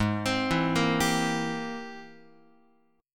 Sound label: G sharp Augmented Major 9th G sharp Guitar; G#+M9 arpeggiated; JGuitar